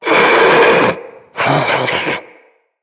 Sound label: Infested Ancient Idle; Enemies; Puss Ancient